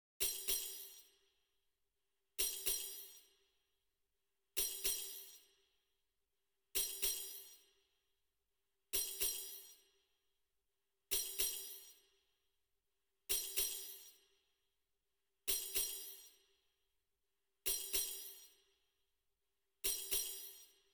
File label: Bowerbird Song BGM Shaker; Songbird Symphony